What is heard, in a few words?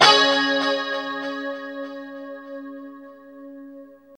2CHD C#5, Guitars; Spectrasonics; Hans Zimmer Guitars VOL-1; JC-SHEEN